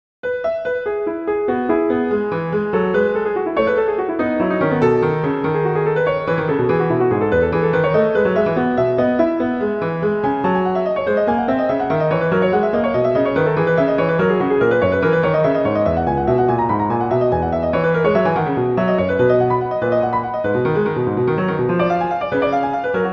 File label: Gigue; Bach; French Suites; Suite VI